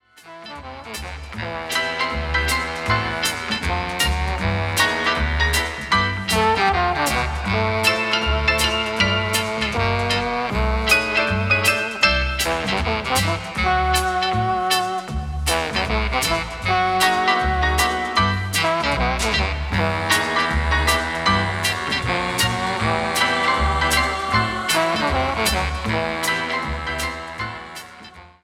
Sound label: Orchestra and Chorus